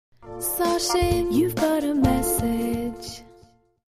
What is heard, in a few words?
Msg Tone